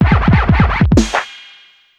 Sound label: Scratch Play, Yamaha DD-35